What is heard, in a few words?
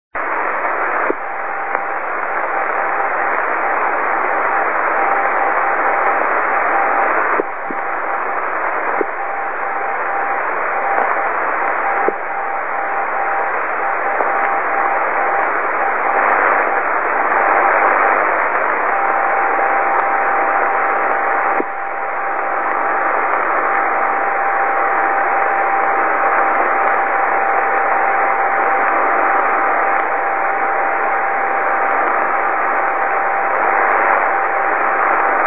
B 2m Tropo; F5XAL